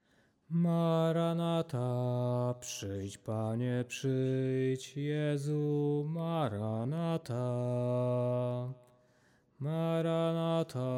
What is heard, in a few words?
tenor; Maranatha